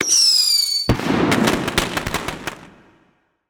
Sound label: Firecrackers; Flinching Firecrackers